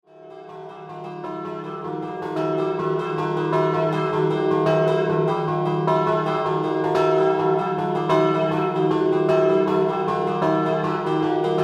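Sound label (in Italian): Plaino scampanottata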